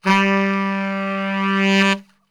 G 1 SAX, Brass Super Section; Best Service

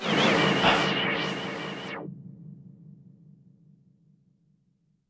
Vacuum, YAMAHA RM1X

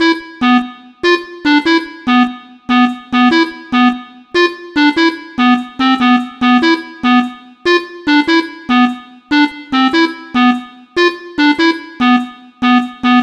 Blip Hit Stab; Sonic Foundry (Sony Creative Software); Club Joints and Street Anthems

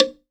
log drum, LOG DRUM; AKAI S-Series CD-ROM Sound Library VOL-5

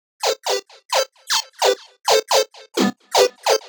130 A, uk garage, Synths; 130bpm Lines n Loops

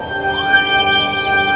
SHOWER; NorthStar - The Wizard VOL-2; RAINMAN

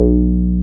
303, TB-303 BASS

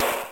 Snare, Genesis; Sonic Spinball; M8

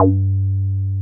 303 RES G3P, RES BASS

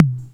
Tom Space, TR-606 Bent Samples; Sonic Foundry (Sony Creative Software); The Electronic Music Manuscript Collection; Battery 3 Sample Kits

